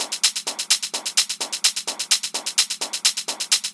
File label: House Loop, 128 BPM; Vengeance Samples